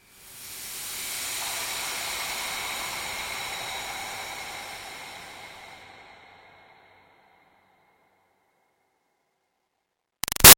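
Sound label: Swell Airy Toxin Steam Release Medium; Sound Design; Swells; Big Room Sound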